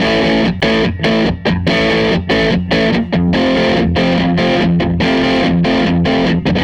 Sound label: Les Guitar Loop